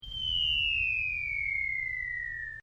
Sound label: split shot; holidays; snowbrawl